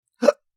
Adult man hiccup single

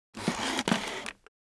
Light furniture sliding on floor moving

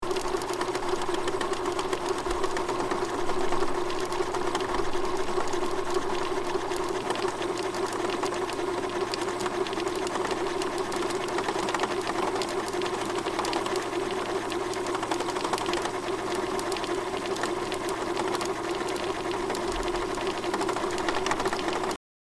VHS video cassette static noise

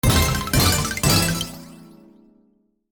Slot machine casino medium win

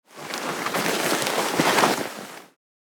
Leather fabric handling

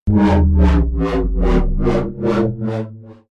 Drone pulsating descending

